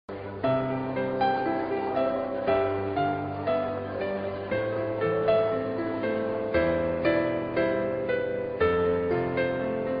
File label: Cannon In C; Pachebel